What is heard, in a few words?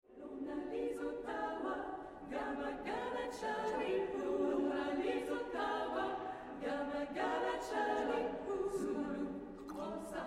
African Celebration